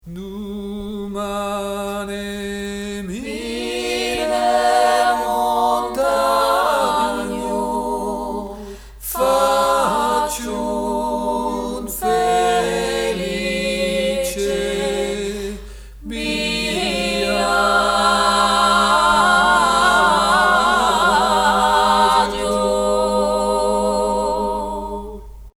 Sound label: Biasgina Tutti, chant; Orfées Productions